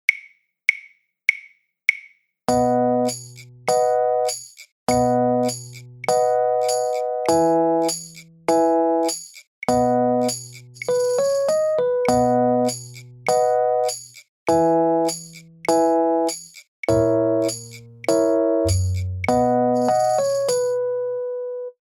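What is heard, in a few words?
Backing Track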